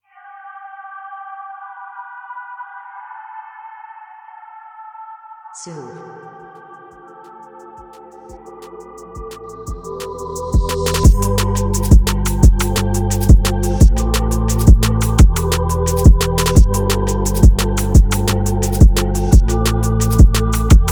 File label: DnB 174BPM